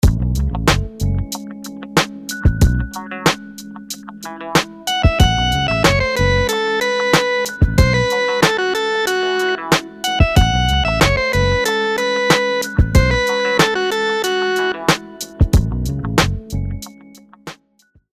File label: rhythms dotted 8s